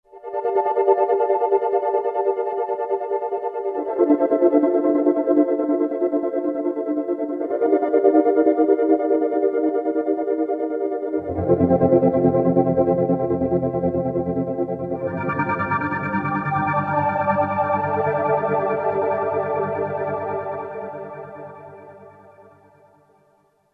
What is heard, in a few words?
SynPuls; Roland SR-JV80-09 Session